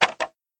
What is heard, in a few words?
Light Button Fail; Five Nights at Candy's